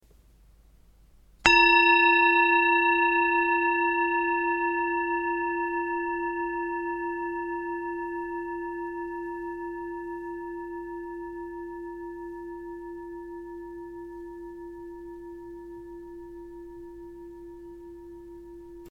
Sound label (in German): Klangschale